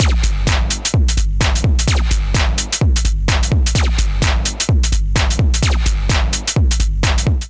Jazz Beats 128 bpm; MIDI Clips; Ableton; Factory Packs; Skitter and Step; Jazz Lyfe

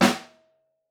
SN1 Room, Snare; Ableton Foundation FreePacks; Snare Designer

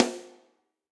Snare Rim Bright Room; Drum Hits; Standard Hits; Ableton; Factory Packs; Session Drums Studio

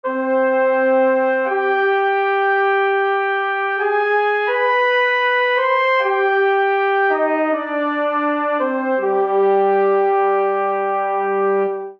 Phrase Obelisk C Minor 80 bpm, Brass Quartet; MIDI Clips; Ableton; Spitfire Audio

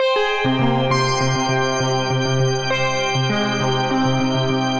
Plaintive Keys - Cmin 100 bpm; MIDI Clips; Tonal; Motifs; Ableton; Mood Reel

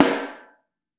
SN Mono, Snare

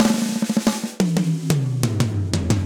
Fill Cold Tight Room 90bpm, drums; Gallop; Ableton; Factory Packs; Session Drums Studio

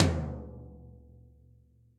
Tom Floor DeepSnareDynamics; drum hits; Ableton; Factory Packs; Session Drums Club; Standard Hits

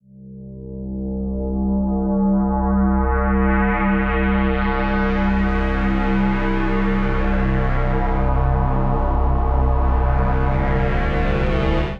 Constellation Bass C 80 Bpm; MIDI Clips; Instruments; Ableton; Drone Lab

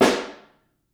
SN Room, Snare

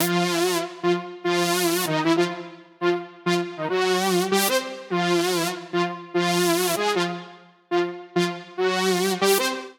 Funk Lead Eb minor 88bpm; MIDI Clips; Melodic; Ableton; Factory Packs; Chop and Swing

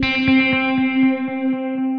Guitar French Guitar Pad, Guitar Amped; Guitar and Bass; Ableton; Factory Packs

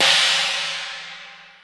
Ac China, Drums; Ableton Foundation FreePacks

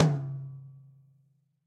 Tom High Classic Muffle, Drum Hits; Standard Hits; Ableton; Factory Packs; Session Drums Studio

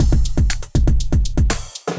Acoustified Kit, Drums

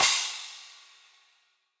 Crash FX Old School, Cymbal; Drum Hits; Standard Hits; Session Drums Studio

